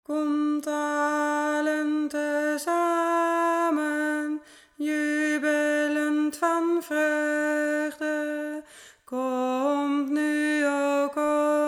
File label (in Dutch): Komt allen ALT